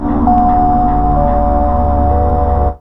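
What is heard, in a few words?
synth pad; E-Lab; X Static Goldmine 1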